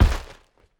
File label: Landing; LetterLeaper